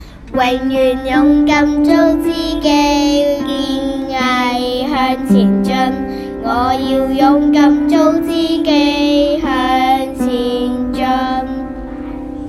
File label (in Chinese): 舊曲新詞